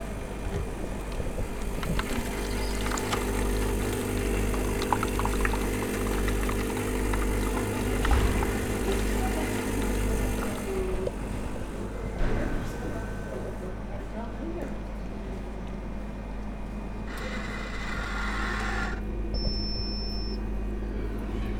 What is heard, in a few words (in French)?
Machine a cafe; isba